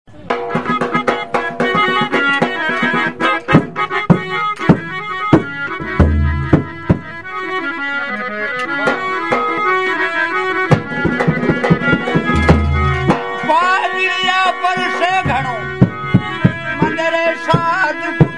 harmonium and percussion, Field Recordings from India; artists hotel; WFMU